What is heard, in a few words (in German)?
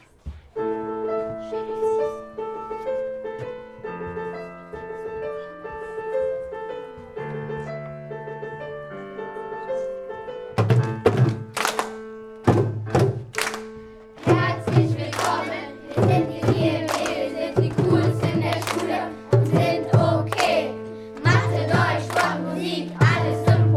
Klassenrap; ruesselsheim